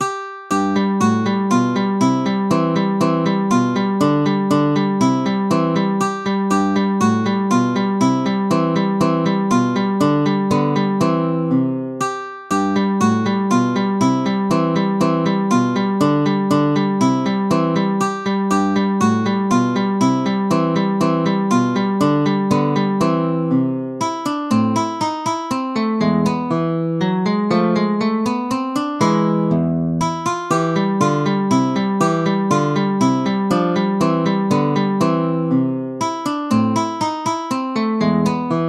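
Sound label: Waltz, MIDI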